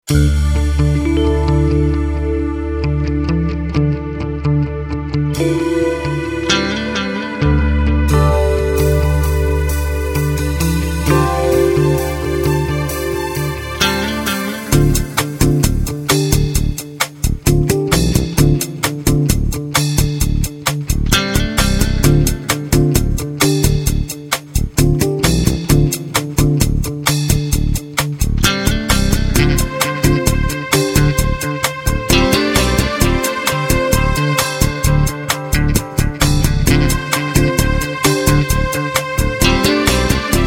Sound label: STRINGS N GUITARS